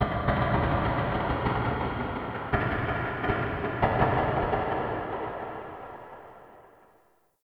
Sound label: Wooden Clicks; Warframe; Shadowgrapher